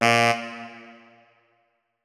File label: notes, saxophone; Hideout Furniture